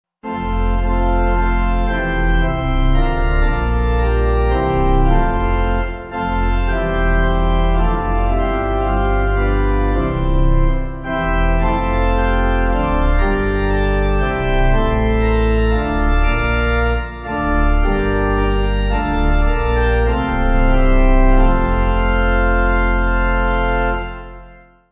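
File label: Pipe, Pipe Organ backing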